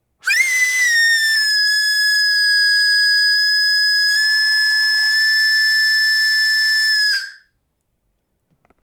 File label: deep steam whistle, ship